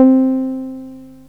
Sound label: SOFT C4; Best Service Alpha Dance vol.2; SOFT SEQ.1